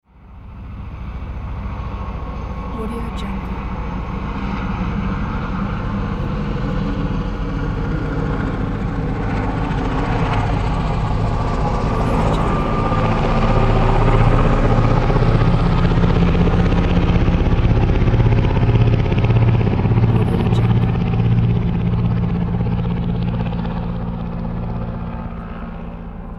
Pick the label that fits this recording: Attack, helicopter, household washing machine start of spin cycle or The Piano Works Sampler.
helicopter